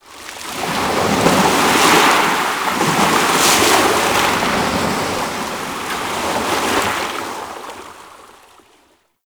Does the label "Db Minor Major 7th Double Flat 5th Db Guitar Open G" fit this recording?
No